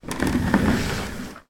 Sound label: office chair roll across carpet floor; sound effects one